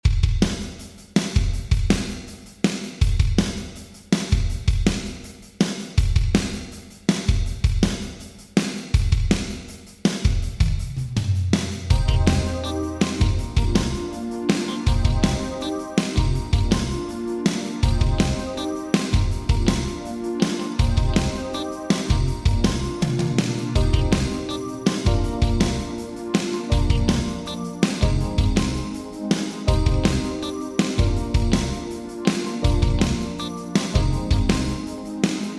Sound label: Drums Korg DRV 2000 Garage Vintage Digital